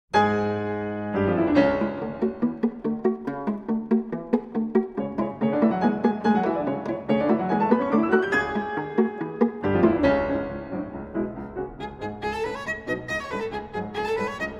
Pieces For Viola and Piano III. Vite et Nerveusement Rythme; Boulanger